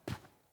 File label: Boot Walk Default, Footsteps; Characters; Enemy; Boots; Sinecure; Melee Grunt